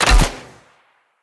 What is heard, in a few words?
Bow Shot; Sinecure